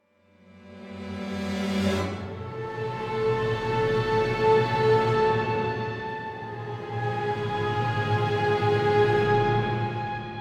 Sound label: Monster Hunting; Skellige